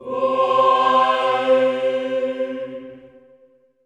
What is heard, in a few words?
mix combi; Sound & Vision - Gigapack I CD 2 (Roland); Combinations